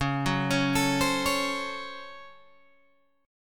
C sharp Major 7th Suspended 4th Sharp 5th, Guitar, Open G